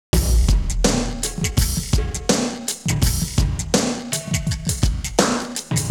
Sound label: Exotic Beat